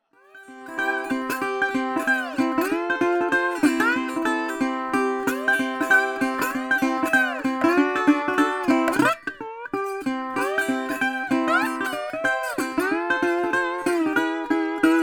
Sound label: lap steel